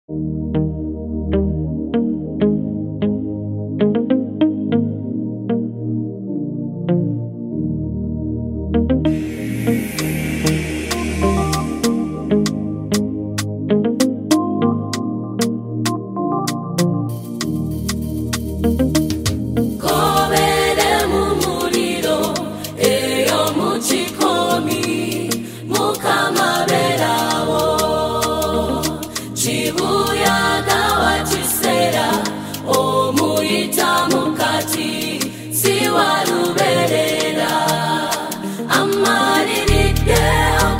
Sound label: Stage Performance By Choir, gospel; Kennedy Secondary School; zambian gospel music